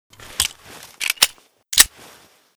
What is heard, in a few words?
reload empty, weapons; fort